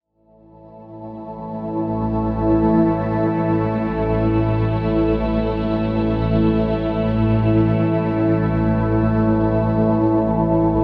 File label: Sleep Flow